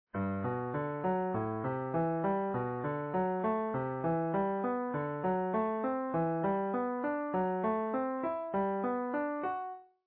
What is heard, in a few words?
arp, jazz guitar